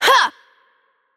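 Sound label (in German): Attack Heavy, German; de DE; VO; bloom minigame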